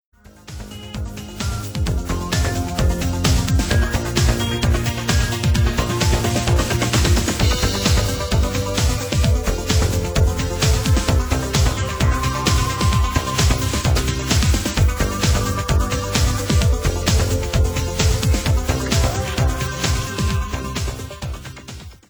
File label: Chorus Single Low End Instrumental